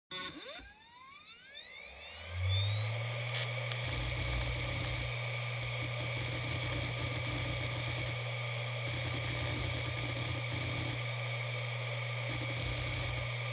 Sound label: Hard Disk Drive (Operating Noise)